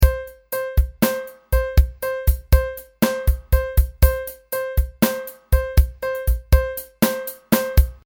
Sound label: Drum w Pulse